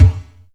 Strange Kicks, Percussion; Dance Synths

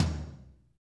tom, Real Kit

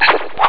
sword; Amiga Soundtracker sample

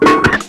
noise, FX & ATMO, ALARMS & ALERTS

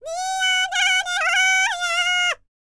BLACK SEA, World Voices; ILIO; Vocal Planet VOL-5

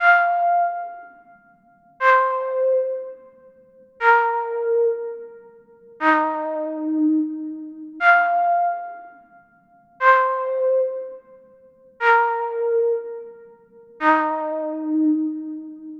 Progressive Synth, Trance

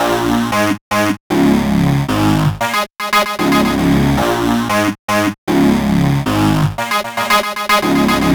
Hott Fuzz, Synths

